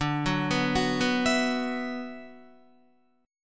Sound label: D 6th Add 9th D, Guitar; D6add9 arpeggiated; 9,9,11,11,11,12; JGuitar